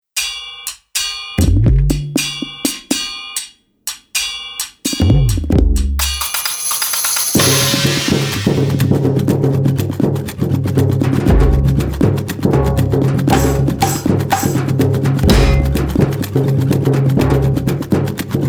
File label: Office Stomp